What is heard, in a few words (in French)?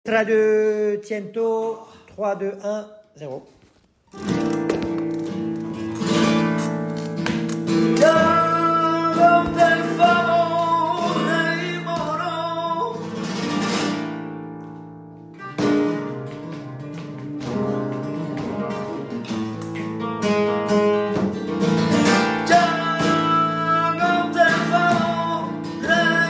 letra tientos et refrain passage tangos, flamenco